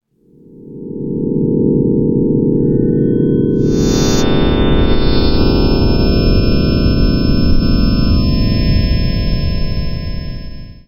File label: moon glitch; Integrity is an intelligent Void Entity and the main antagonist of The Broken Script; The moon glitch.ogg sound effect is the only indicator of his presence in The Broken Script.